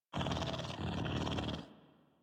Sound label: Pet Purr; Enemies; Warframe; Catbrow; PetShip